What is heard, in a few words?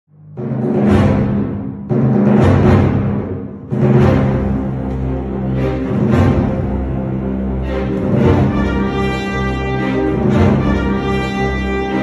orchestra ringtone